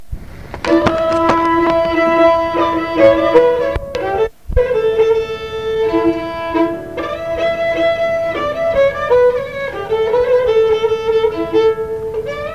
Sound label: Pandalash line#1, Gadulka; Lesson Recordings; Nikolay Kolev - Gadulka Lessons